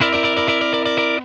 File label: C JANGLE, Funk Guitar; Zero G - Funk Guitar